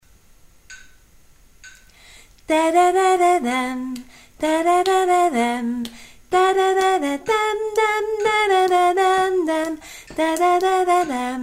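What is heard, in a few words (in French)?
c'est une chanson soprano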